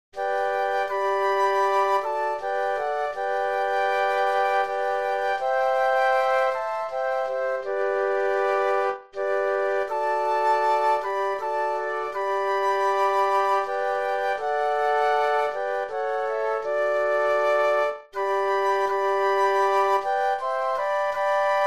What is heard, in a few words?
Flute Quartet